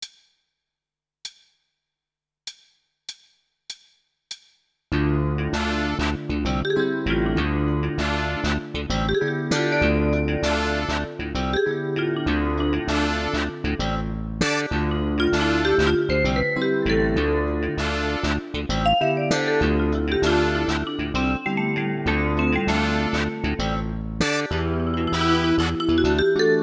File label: Rock Funk